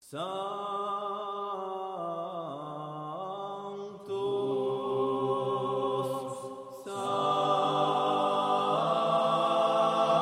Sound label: corsica sanctus, polyphony